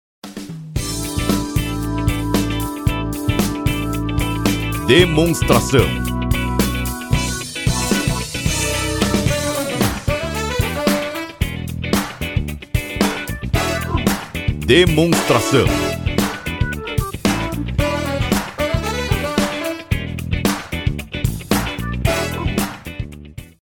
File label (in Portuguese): Trilha